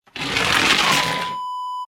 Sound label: object slide concrete